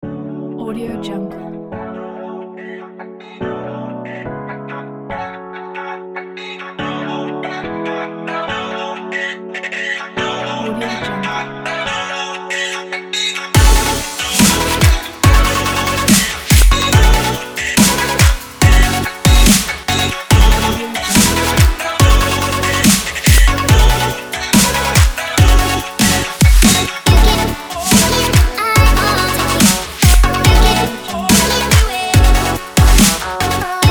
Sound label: summer future bass pop funk